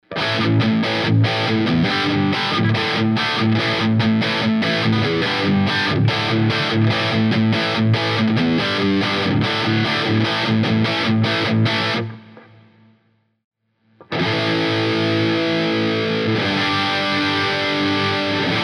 bountyhunter dirty, humbuckers; pickups

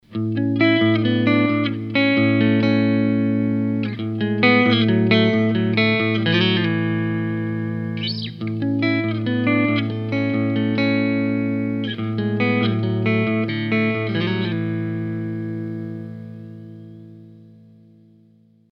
clean split; humbuckers; altitude; pickups

